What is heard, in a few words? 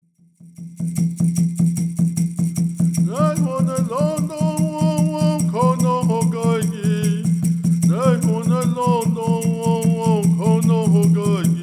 Peyote Song